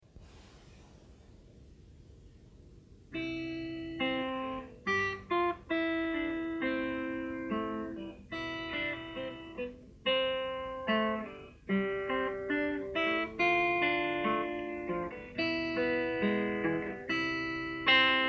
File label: unplugged